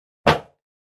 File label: Garbage Can Metal Buc; barrels buckets & cans